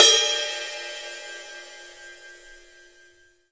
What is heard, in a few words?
sizzle bell, Rides